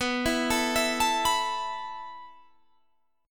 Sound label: A Suspended 2nd B